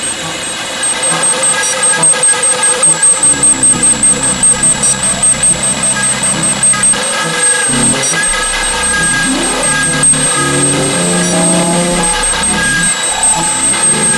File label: antitonal, synth